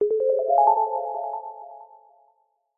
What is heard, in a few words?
fantasy magic mystery glissando simple sine delay